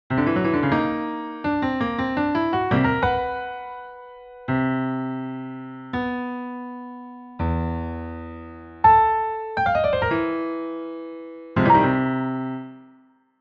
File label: Short Melody